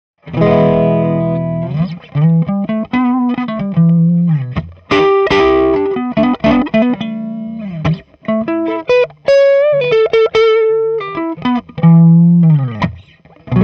tele neck dirty, pickups

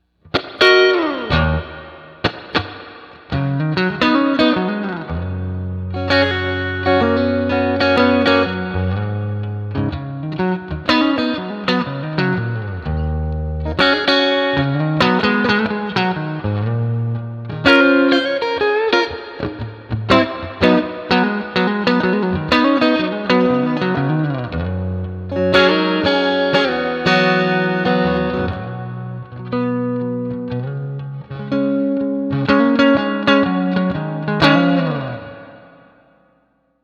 Epic 1955 Neck Princeton Reverb, pickups; Klein

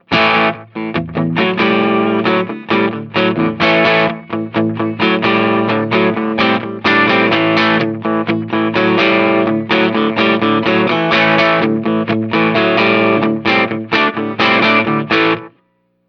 rock n roll rhythm bridge pu

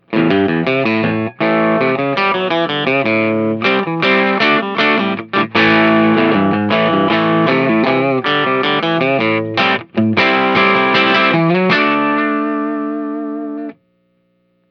rhythm bridge pickup

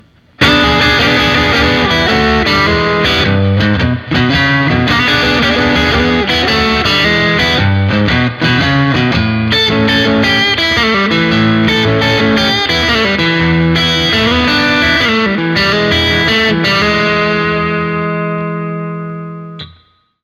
neck, pickups

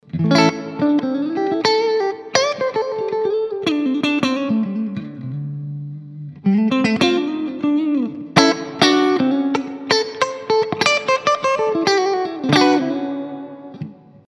jazzy

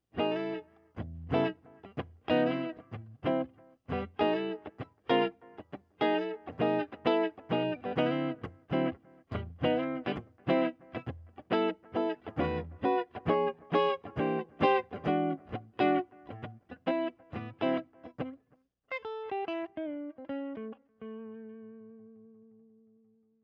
wicked paf neck

